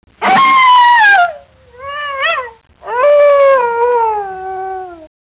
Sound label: western wolf; ambience